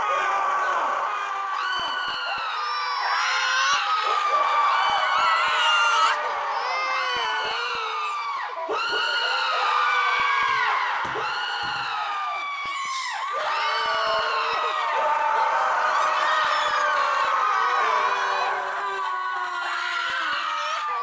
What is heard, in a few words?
Deathly Choir